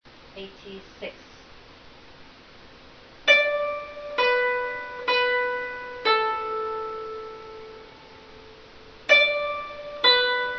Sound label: zheng